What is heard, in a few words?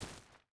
footstep barefoot stones run; Rust